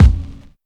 short reverb kick sound D# key, Royality free kick single hit tuned to the D# note; kick drum; D sharp key